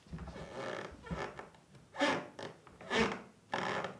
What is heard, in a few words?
creaking